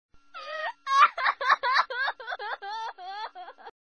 Звук плача женский на взрыв

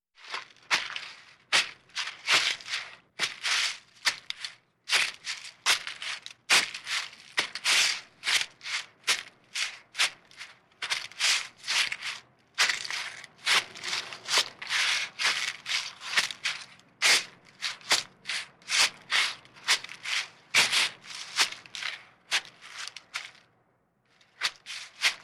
Звук шагов Топтание на листьях

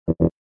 Звук заблокировано

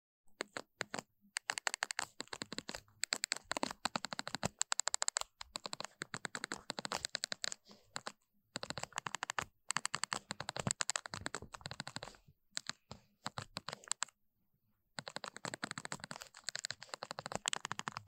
Звук набора смс на старом кнопочном телефоне самсунг

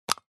Звук открытия аэрозоля

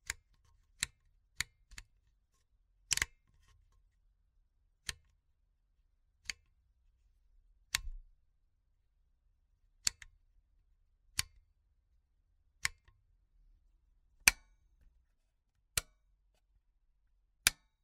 Звук щелчков вращающегося выключателя

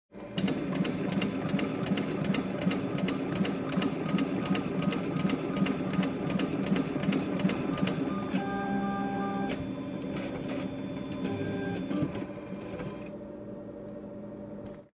Звук банкоматом перед выдачей купюр

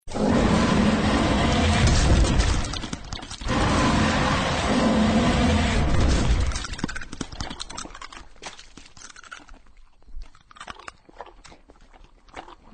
Звук питания тираннозавр

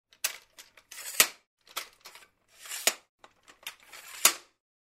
Звук раскладывания штатива

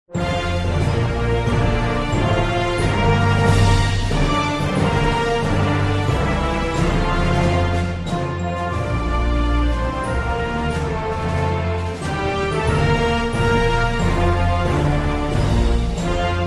Национальный гимн США, Минусовка

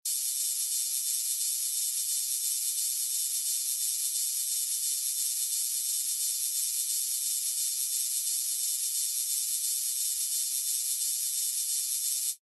Звук лазерной операции

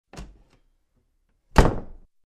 Дверь от туалета открыли и закрыли The door from the toilet was opened and closed

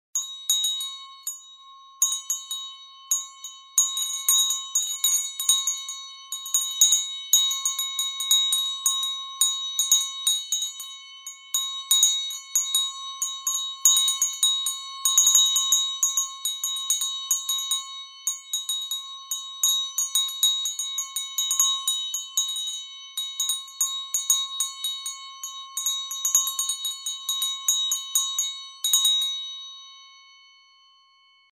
Колокольчик колышется от ветра

